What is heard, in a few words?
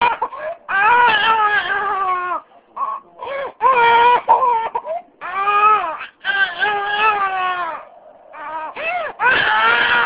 cry